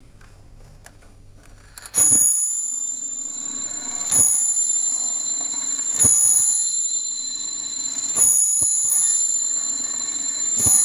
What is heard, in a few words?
antique lantern clock striking four times